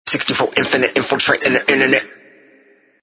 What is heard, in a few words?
Vocals Infinite Infiltrating The Internet 110 BPM